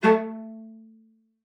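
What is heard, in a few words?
A3, cello spiccato; Xsample VOL-5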